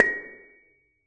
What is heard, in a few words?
Bonang P, Gamelan